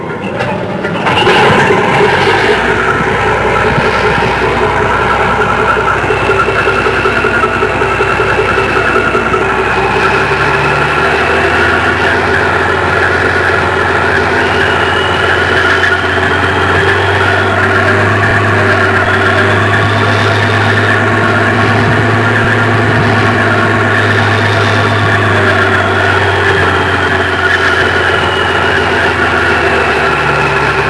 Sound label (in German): schlepper